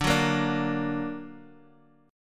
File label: D Augmented D down, Bass Standard; D+ strummed; JGuitar